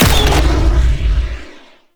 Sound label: Hoverboard Jump Large; Vehicles; Warframe; Venus Hoverboard